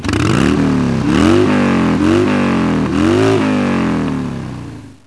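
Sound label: motorcycle; Bike gunning its engine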